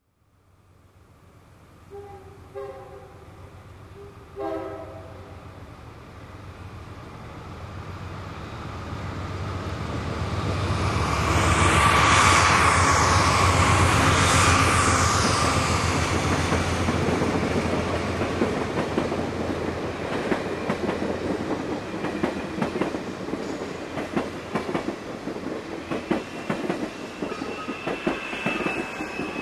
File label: Amtrak